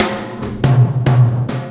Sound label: Combo Particle; Processed Drumkits Zero Gravity Beats